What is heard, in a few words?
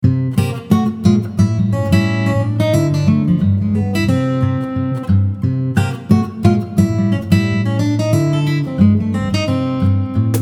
Acoustic